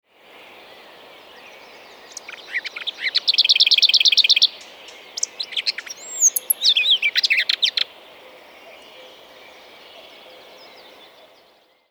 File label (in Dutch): Braamsluiper Curruca curruca curruca